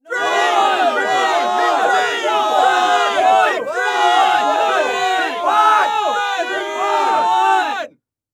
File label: gameshow crowd; NewGamePhysics; MontyHallGame